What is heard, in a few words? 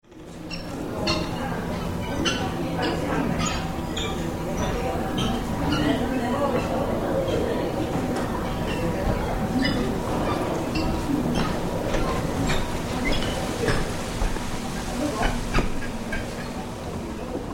nightingale; JAPAN